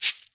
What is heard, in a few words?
cabasa; Western and Latin Percussion; single hits